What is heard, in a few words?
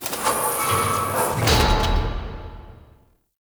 Piston Machine; Ambience; Props; Dante Unbound; Warframe; Entrati